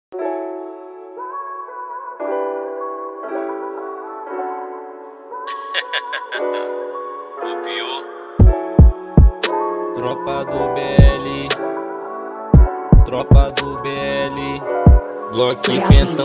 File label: trap